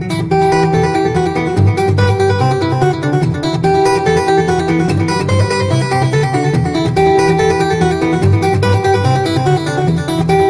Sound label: Guitar in alternative